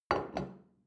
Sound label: plate place table soft; soundpool